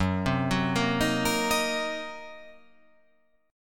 Fsharp 6th Flat 5th down, chord

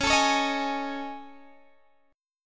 A Major 7th Flat 5th

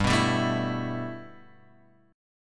G 6th Add 9th G Guitar Standard, chord; G6add9 strummed

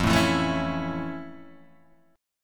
F Major 7th Flat 6th, F, guitar

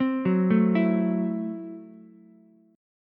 F sharp Minor 7th Flat 5th F sharp Ukulele; arpeggiated; JGuitar

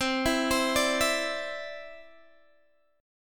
C-Major Add 9th, Guitar DADGAD

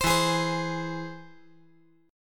E Augmented

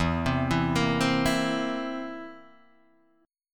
Bb Suspended 2nd Flat 5th

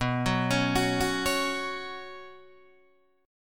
B Suspended 2nd, Guitar, DADGAD

